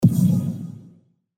Burst Dark

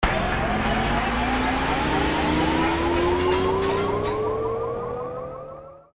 Burst Crash

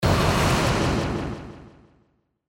Burst Reverb

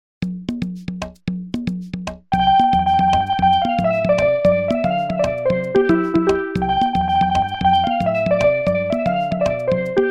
Caribbean; Soundzabound Volume 1

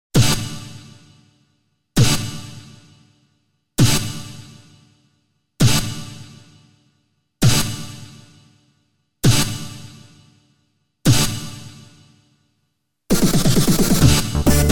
Go TEAM no vocals; Soundzabound Volume 3

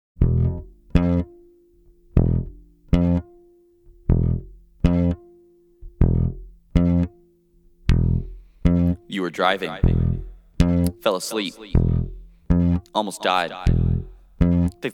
Rumble Strip

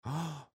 gasp

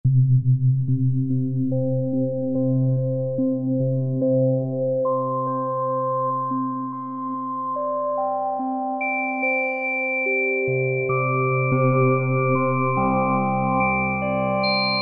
Synth

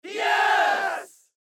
crowd yes

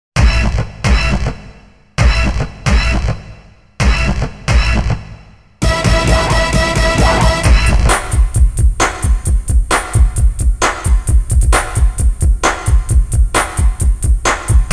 Your Game Iz Through (no vocals); Soundzabound Volume 3

